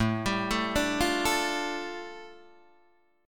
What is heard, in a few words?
A 7th Suspended 4th Sharp 5th A, Guitar Open D; chord